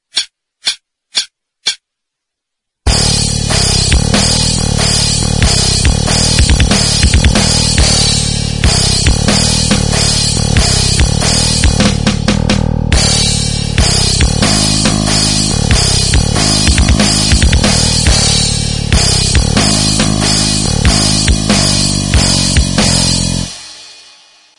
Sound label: backing